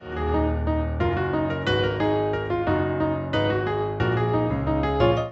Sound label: Piano Ringtone